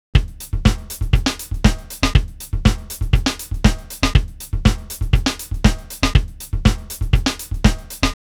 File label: drum replacer